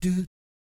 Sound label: "doot", Jazz Fx, F#3; Spectrasonics; Vocal Planet Vol.3 Jazz Fx